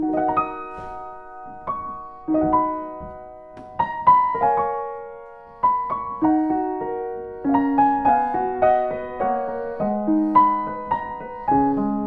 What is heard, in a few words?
Pfeiffer 116T, piano